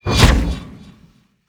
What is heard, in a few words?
illusion release